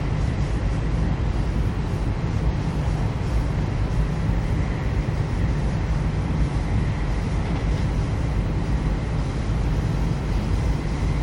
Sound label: Train, iOS Sounds